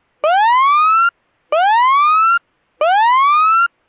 Temporal Slow Whoop, NFPA Coded Slow Whoop 424Hz and 774Hz